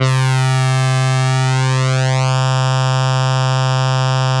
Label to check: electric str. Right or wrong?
Right